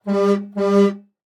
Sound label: Semi Truck Horn; Blastwave